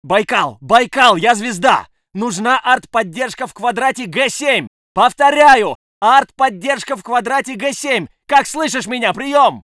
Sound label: Artillery; Red Orchestra Ostfront 41-45